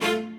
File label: strings